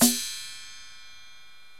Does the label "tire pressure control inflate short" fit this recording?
No